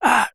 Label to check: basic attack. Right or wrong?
Wrong